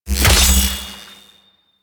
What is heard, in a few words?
Fishing Spear Fish Caught; Warframe; Venus Fishing Spears